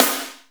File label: Snare, Akai MPC500